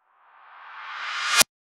modern ui riser ascending; ascending pitch; SFX Engine

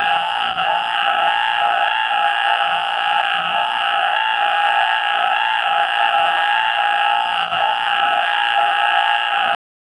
6 legged sci fi mechanica, robot

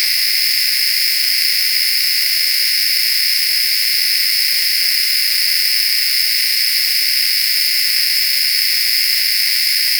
steady smooth white noise; textures

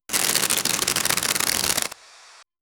crinkling

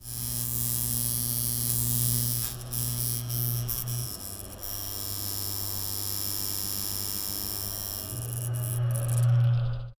electric fence humming

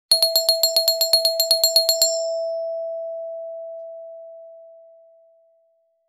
a classic doorbell chime with a pleasant tone

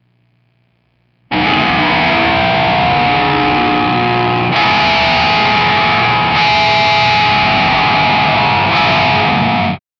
guitar sound

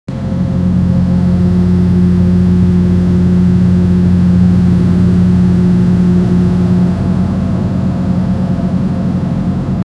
cargo ship exhaust sound

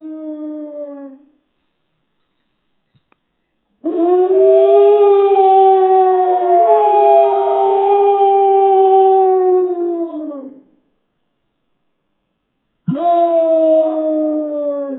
beluga whale sounds, marine life

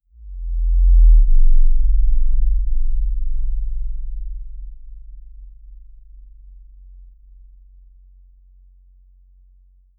cinematic

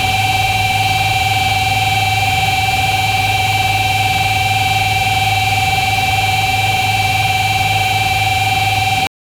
a seamless looping car tu, automotive

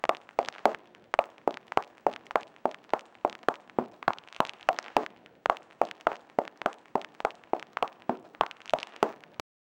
footsteps on concrete low, boots; sound effect

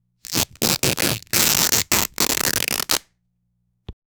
loud ripping velcro sound, clothing

